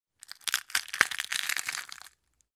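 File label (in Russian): кости для ужасов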